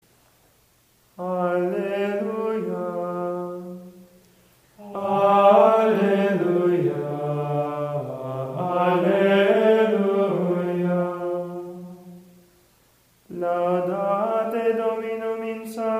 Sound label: Alleluia 3x Laudate Dominum; Easter Vigil